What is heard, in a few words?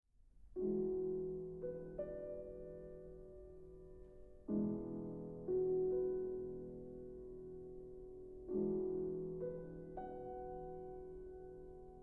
Violin Sonata in A Major, Allegretto Ben Moderato